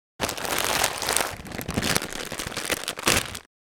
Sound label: Plastic wrapper crackling; gfxsounds